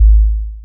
Tomm, One Shots; Sonic Foundry (Sony Creative Software) - Future Retro Dance Excursions

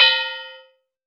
atarigane, cymbals gongs

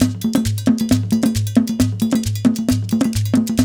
JU JU, African Contemporary

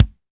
BD, Roland; Sound & Vision - Gigapack I

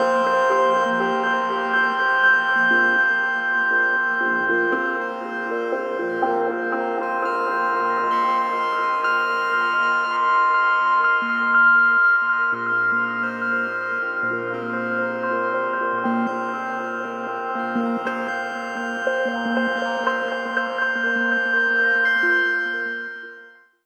texture aurora C Penta, One Shot Textures; Transmission-X

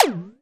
Zip Boing, cartoon; Classic SFX; musicradar; cartoon caper

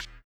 BUZZER; Spectrasonics; Metamorphosis

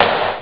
sdrum; cameo; ST-XX Amiga Soundtracker Sample Packs

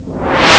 Reverb, Amiga Soundtracker; ST-XX Amiga Soundtracker Sample Packs

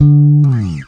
E BASS D1, FINGER BASS; AKAI S6000 CD-ROM - Volume 1

